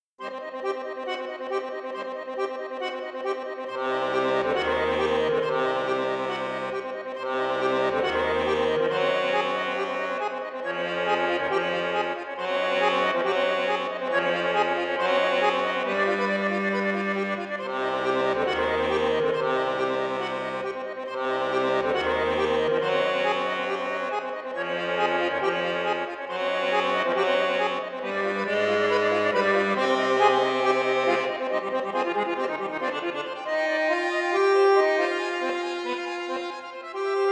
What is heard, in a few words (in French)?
registre 16 8 4, accordéon; suite gothique toccata; borsini bayan